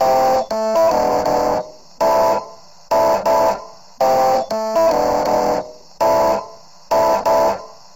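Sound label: wet epiano, Multiband neural audio effect; Demo without amplifier; Wet-NoAmp; prism distortion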